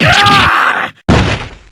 superdamage; Hazama

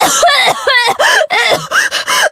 cough

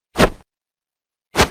pose

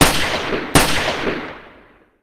shot twice; dhurke

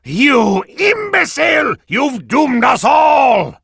disappointment

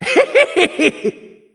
giggle; Hazama

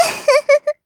giggle; nemu

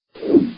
swoosh; clippy

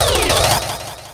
pirouette

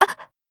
ah; rin

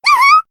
shock; rik

